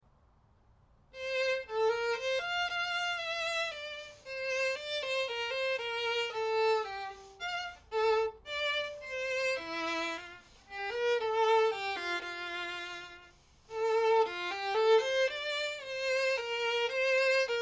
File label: minuet, violin; gluck